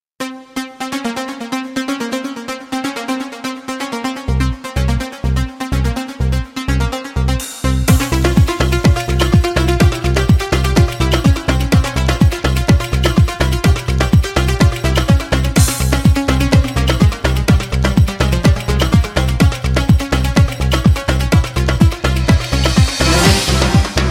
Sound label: serbian party